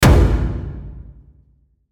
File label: Hit Drum Reverb; musical; Blastwave